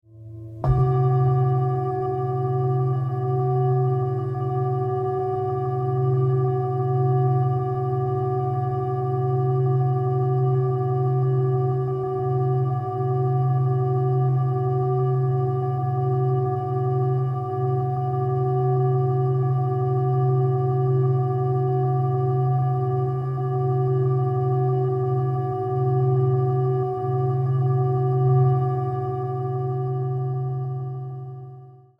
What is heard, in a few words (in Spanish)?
gong mezcla